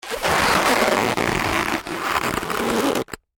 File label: Foley Balloon Rub Long; Balloons; Cartoons